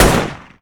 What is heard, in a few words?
Cx4 Storm Fire